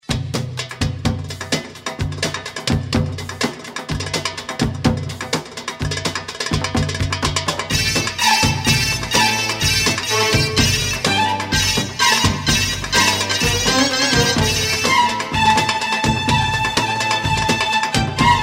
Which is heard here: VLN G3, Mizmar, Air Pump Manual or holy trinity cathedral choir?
Mizmar